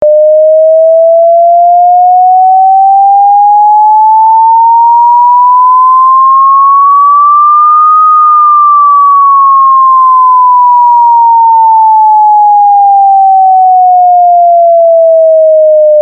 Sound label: Tone